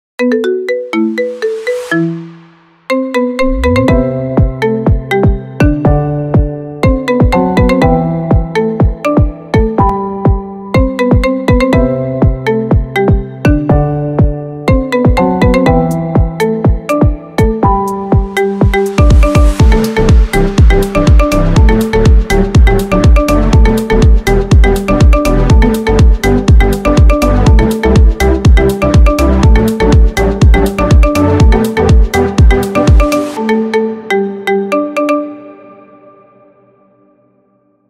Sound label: marimba ringtone cover